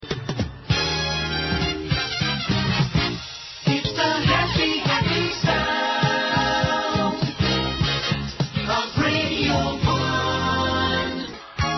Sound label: 1980s logo music